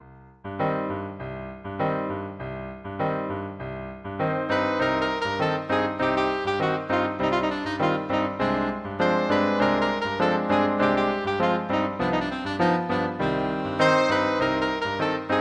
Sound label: habanera, saxophone